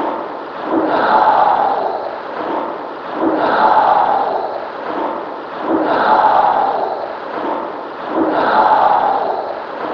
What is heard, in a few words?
LOUD SIGH LOOPED